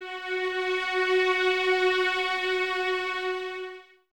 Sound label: Violins Exprsive, String Ensembles; Miroslav Vitous; 11 Violins